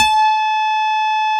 CP70 C4

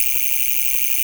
Vibra Loop

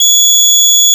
Harmonica C6

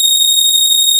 Synth Strings D6

